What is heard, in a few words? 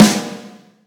soft hit clap; osu!